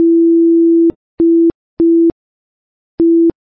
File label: Morse Code